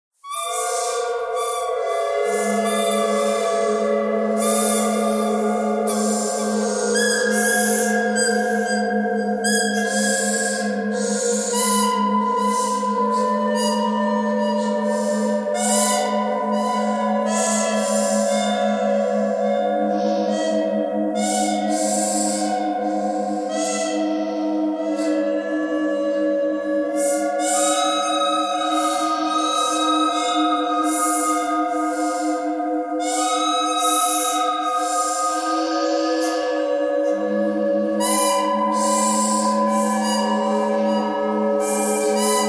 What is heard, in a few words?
bird bird canyon thread noise all; birds